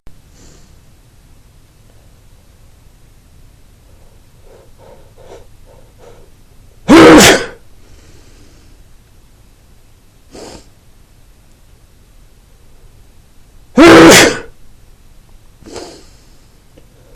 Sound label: persistent sneezing, male